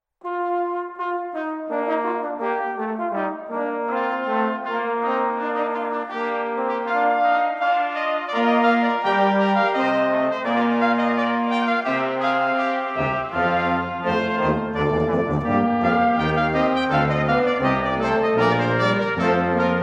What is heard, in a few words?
Canzona Quarta, brass